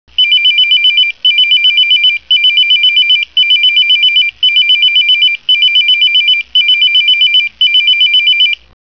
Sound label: beeper alarm